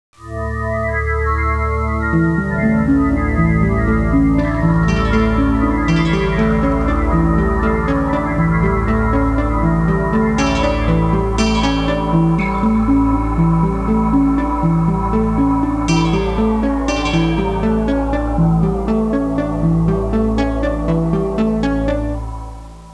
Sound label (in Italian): musica elettronica; Suoneria